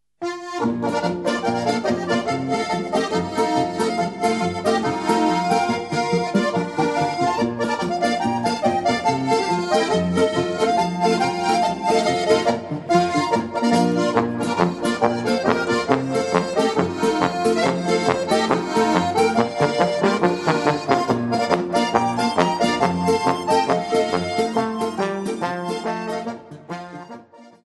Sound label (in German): polka